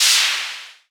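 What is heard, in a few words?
cancel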